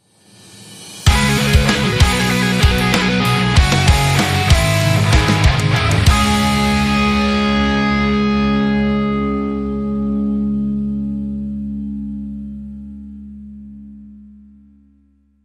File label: Arena Rock